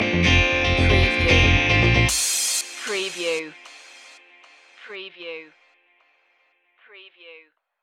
disco stepping stinger

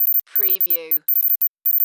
Teletype Readout Beeps

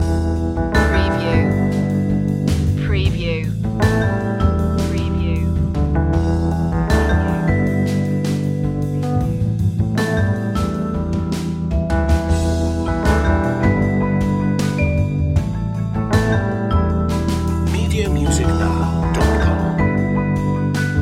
central groove loop

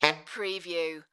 Sax Honk

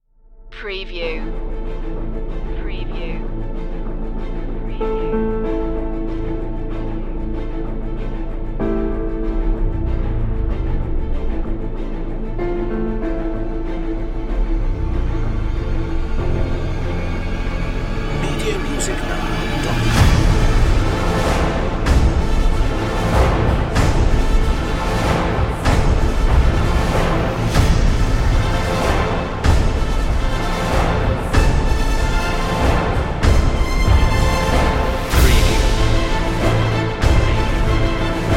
hit hard no melody

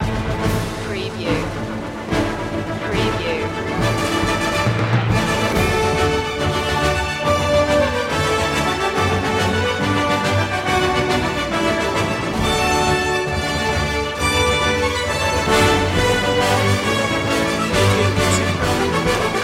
heroic

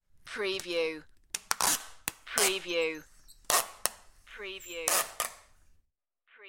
Scotch Tape Rip

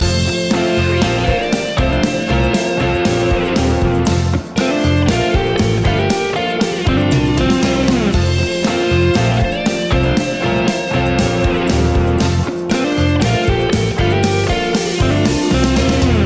wake up pop loop

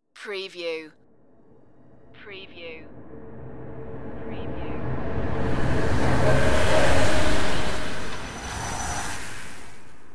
dimension glitch flyby

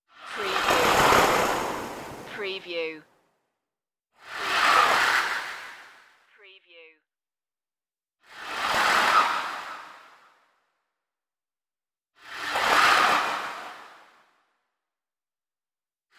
starship slowdown swooshes

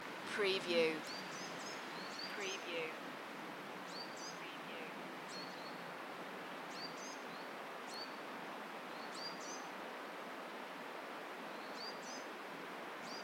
Welsh forest ambience with stream; Moel Famau; beanstalk audio

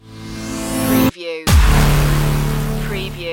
block party stinger

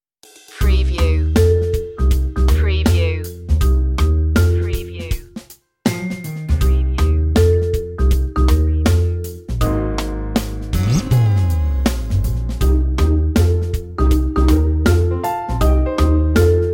life style tv light comedy

